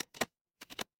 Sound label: ui unlock